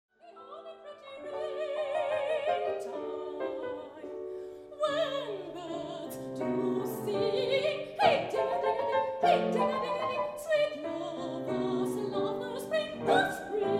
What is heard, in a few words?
birds do sing